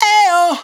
riff aye oh high Bbm, Dry Vocals; Raw Vocals; Slate Digital Omnivox Vocal Sample Pack

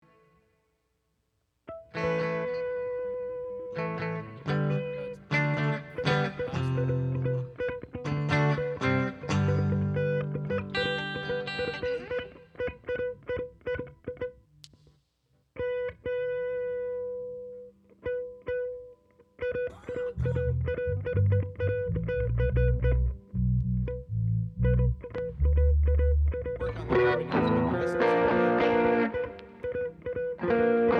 Strings Emphasis Mix

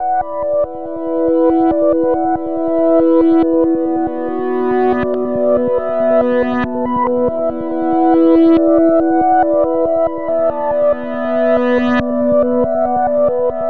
songstarter 140 Bm, Pop; SD PASADENA Pop Sample Pack; Slate

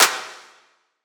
clap measured; Drum One Shots; SD MIDNIGHT Sample Pack; Slate; Claps

